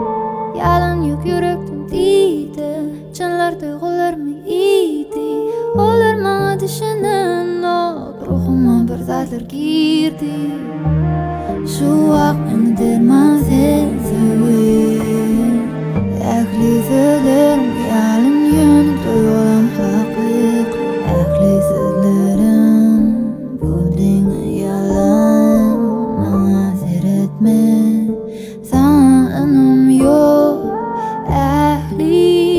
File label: acoustic